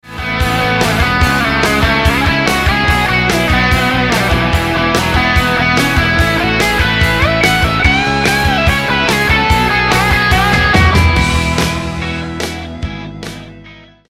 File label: Solo Tone